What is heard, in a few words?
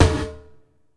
Gated Snare; Univerb; creative gate; Gated Reverb